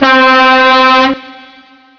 horn, vehicle; chs8

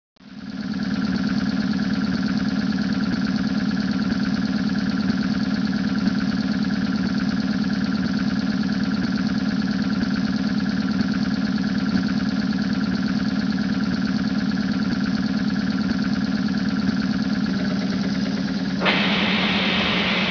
compressor, vehicle

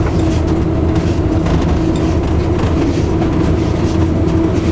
engine, vehicle; vl80pid

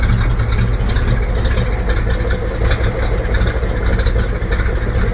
idle, vehicle; zil4331

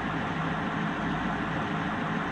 idle, vehicle; car modern

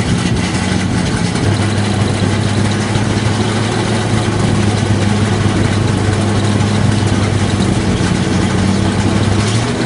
engine, vehicle; zil130

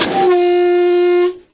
horn, vehicle; chs7uz

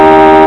horn, vehicle; liaz677

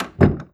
old open door, vehicle

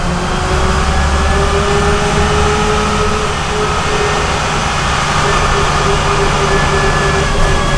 drive, vehicle; liaz5256

